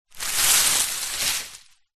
tree branch drag ground leaves; zapsplat

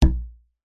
foley rubber band large thick ping thud; zapsplat

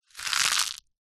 egg shell crush in hand; zapsplat

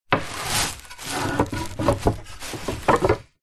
foley wood slats in plastic bag movements

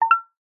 multimedia pop up chime short high pitched; zapsplat

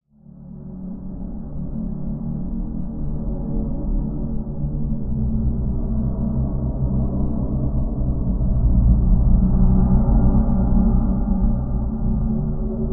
squeaky metal Designed Spooky Drone; PMSFX

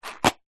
box card small safety pins lid on set down; household

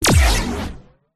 science fiction weapon gun shoot

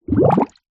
nature water bubbles rise surface small fast

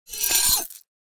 horror knife slice slash skin fleshy gore; zapsplat

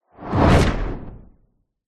sound design shockwave whoosh into metal slam

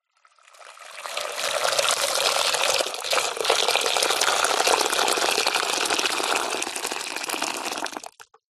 household boiling water pour into metal pan

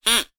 cartoon duck quack whistle single

